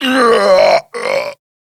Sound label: Death; New War; Kahl